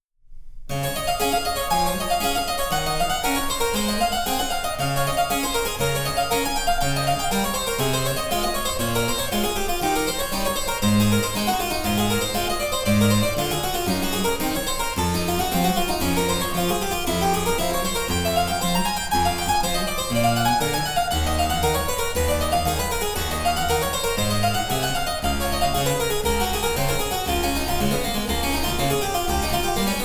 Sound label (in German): Cembalo; Mark Lindley WTC 1